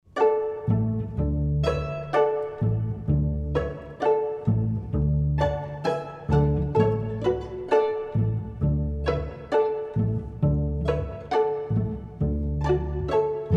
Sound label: Mandolines; Everything String